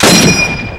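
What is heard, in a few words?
M1 Garand Clip; BlackCow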